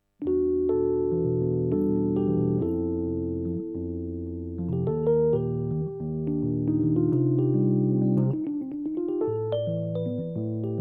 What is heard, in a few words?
Rhodes TC Spring Reverb